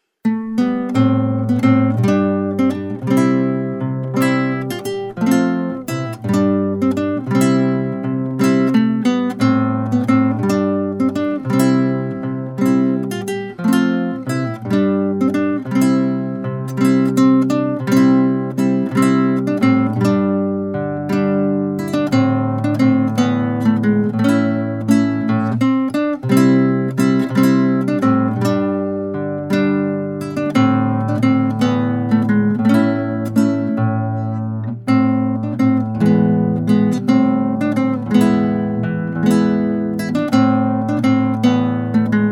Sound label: Spagnoletta, Solo Guitar